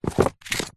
foley raffle ticket books wads set down on top each other; zapsplat